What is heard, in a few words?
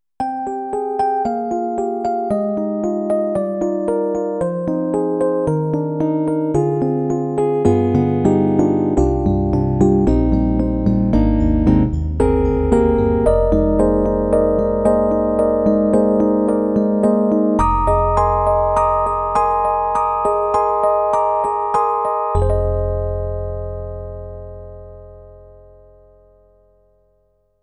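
EDIROL SD-90, Contemporary, FM Electric Piano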